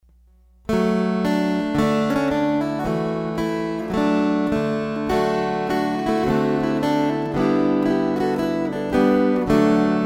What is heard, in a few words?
String Choir